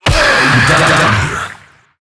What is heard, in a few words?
Infested Lich Pain B; Zeke